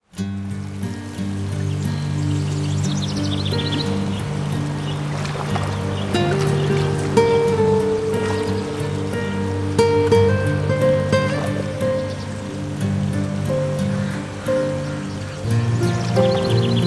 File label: Natural world, alarms; ZTE; Small Fresh 4